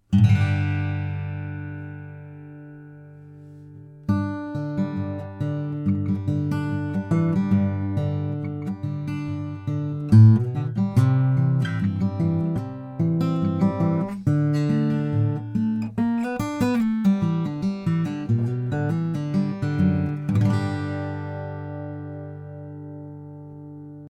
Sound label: Bourgeois Large Sound Hole OM